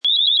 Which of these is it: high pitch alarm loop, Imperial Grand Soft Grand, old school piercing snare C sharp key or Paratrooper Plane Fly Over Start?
high pitch alarm loop